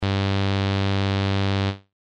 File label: cow